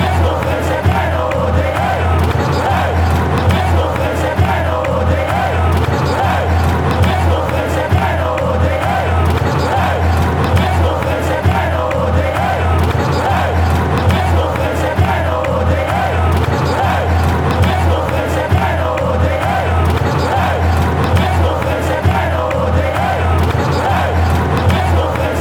scottish, fanchants

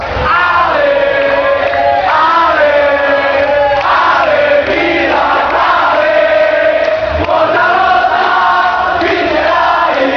force fight win, fanchants

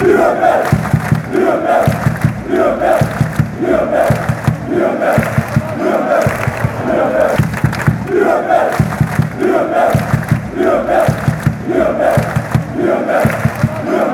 rapid wien fangesange, fanchants

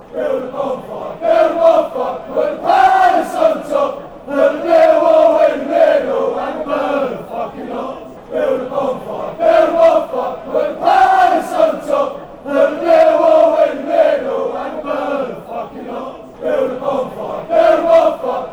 build a bonfire, chant

